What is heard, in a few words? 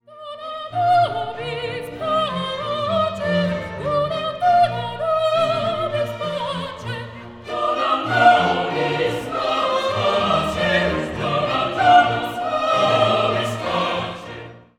Agnus Dei Soprano sings